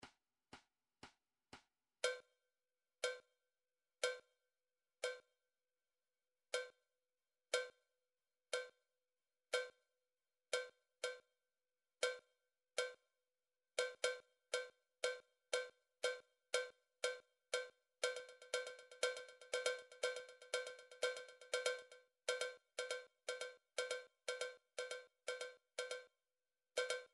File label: cowbell 2 bar; sonar toolbox